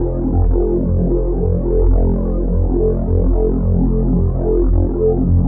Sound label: Grunge Luup; SFX & One-Shots